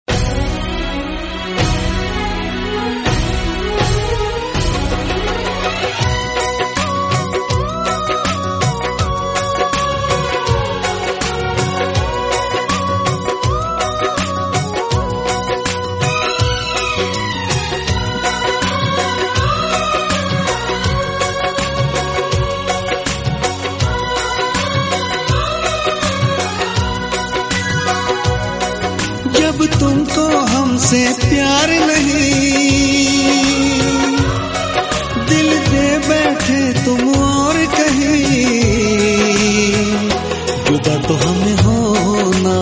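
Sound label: sad ringtone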